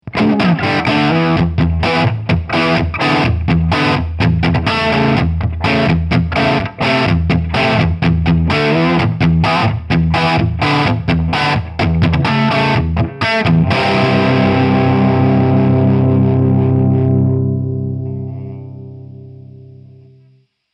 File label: Gibson LP 50s Bridge Pickup Crunch